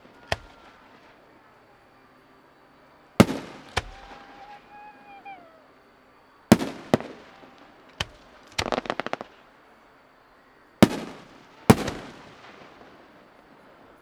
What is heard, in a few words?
fireworks; Ambisonics